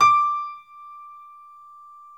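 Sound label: D5 piano; Northstar - New Gold VOL-2; BOS.GRAND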